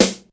sizzly snare g key, steel snare drum sound tuned to the G note. Loudest frequency: 3356Hz